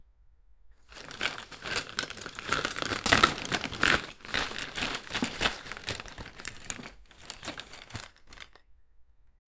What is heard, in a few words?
taking out things from a